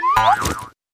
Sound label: trophy bounce; Racetrack Riches; megaboard